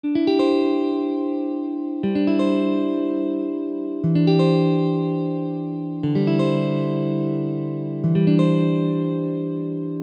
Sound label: chord inversion 7